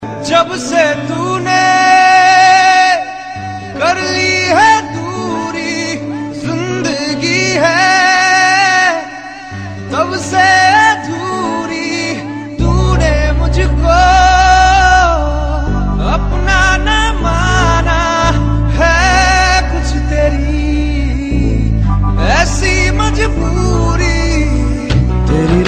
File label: romantic song